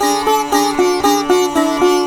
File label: SITAR, Indian Traditions; USB Soundscan vol.45; AKAI